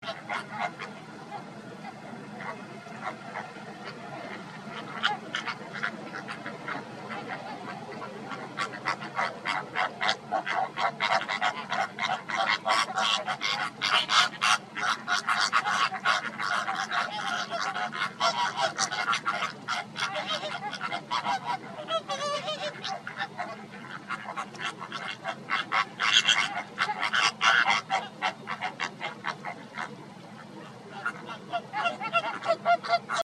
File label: vocalization american flamingo